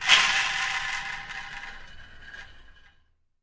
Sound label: spinning a can of beans; Weird and Interesting Hits